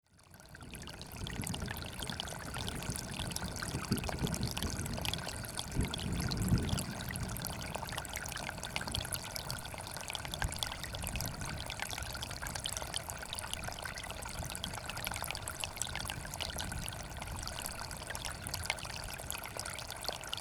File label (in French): Ruisseau 1700m